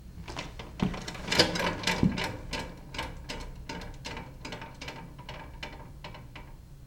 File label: Weight Scale Off